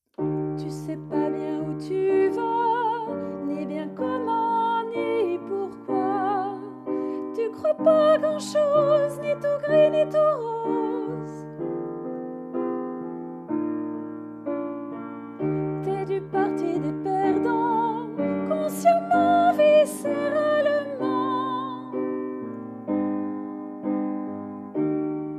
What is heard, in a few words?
tenor